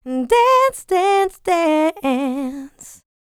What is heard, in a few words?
LICKS, Vocal R&B Dance; LT DANCE